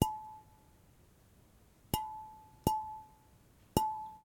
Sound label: Spray Can Hit; 344 Audio; Household Drones